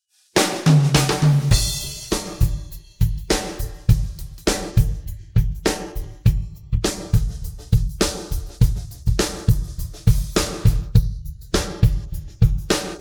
pattern, drums

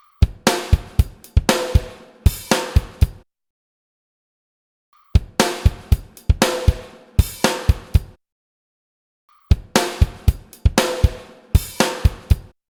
pattern, drums

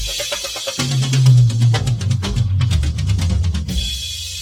roll slow, drums

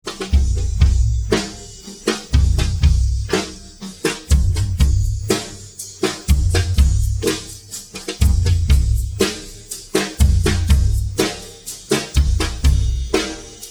pattern slow, drums

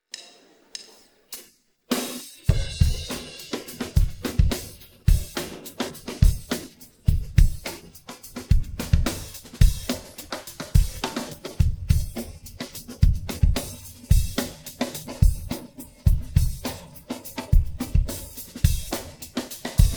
pattern 106bpm, drums

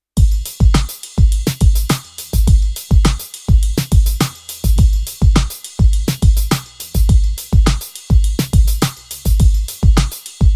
pattern manege karaoke, drums

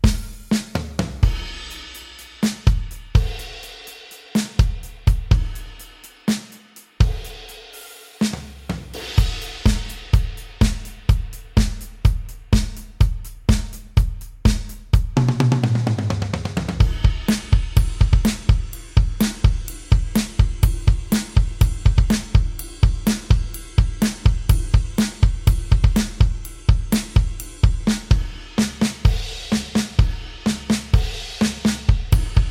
drums karaoke

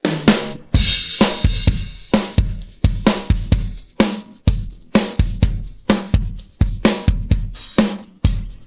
pattern, drums

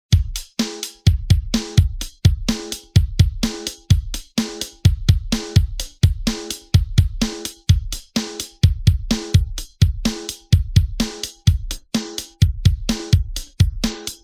drums pattern

